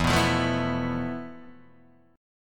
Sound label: F Major 7th Flat 5th E, guitar chord